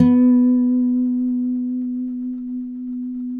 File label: Finger B 3 L, Guitars; Spectrasonics; Hans Zimmer Guitars VOL-1